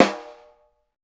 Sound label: snare, Roland; LA Composer